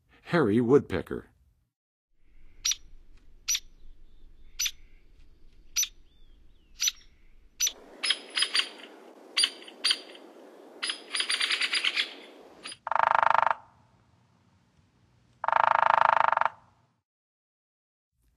Hairy Woodpecker, Songs of Birds; North America; Western USA; Western USA Songbirds

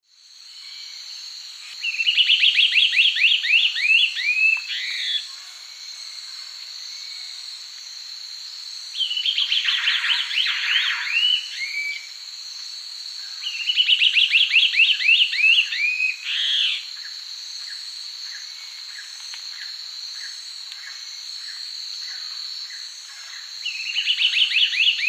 Gymnopithys lunulatus, songs pair; S. America; Eastern Lowlands

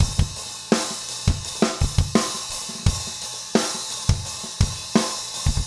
rock 85

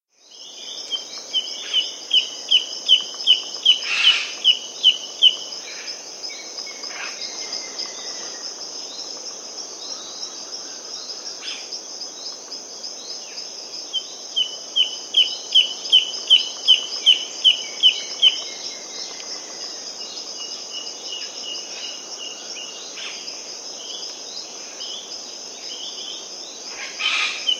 Bamboo Antshrike, S. America

